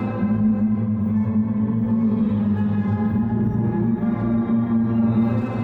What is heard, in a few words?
Tonal Re Draw, sparse soundscape